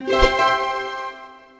achievement; soundspvz

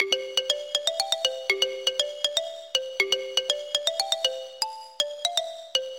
jack in the box; soundspvz

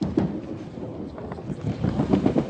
bowling; soundspvz

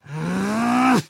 newspaper rarrgh; soundspvz

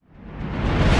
reverse explosion; soundspvz

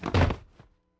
zombie falling; soundspvz

